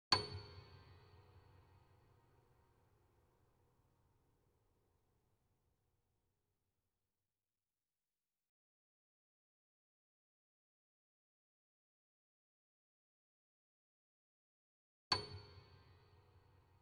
C8 Grand Piano sustain; KORG; Sound Sampling Collection VOL-3; Grand Piano 88

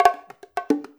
FILL, Percussion

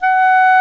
Clarinet & Bass Clarinet

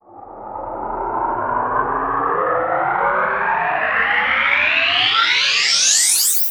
Sweeps Up, Effects

